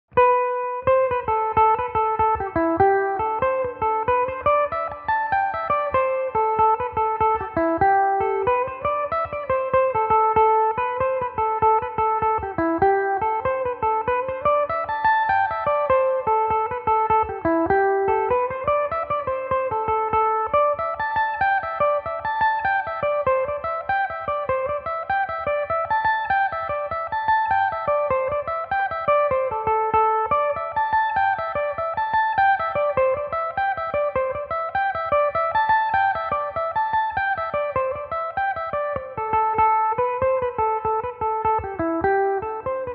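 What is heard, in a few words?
A minor, irish mandolin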